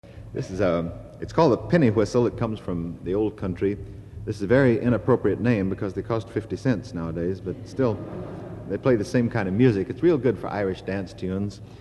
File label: penny whistle